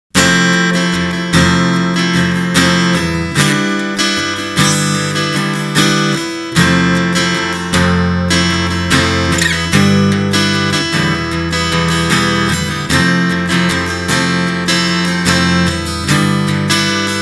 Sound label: Small Room Clean